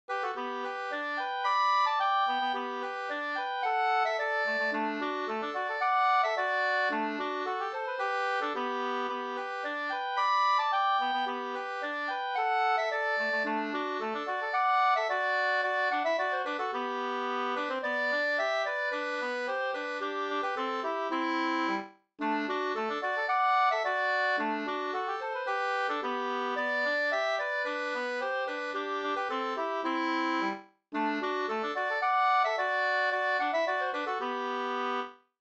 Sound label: polka